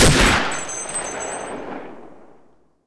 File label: 30cal shoot, weapons; dod 1.3; dod enhancement pack